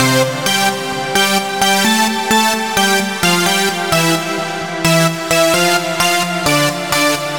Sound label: 80s Flav Main, electric jazz